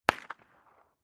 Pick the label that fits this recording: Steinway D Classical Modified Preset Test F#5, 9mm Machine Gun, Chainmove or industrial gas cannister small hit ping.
9mm Machine Gun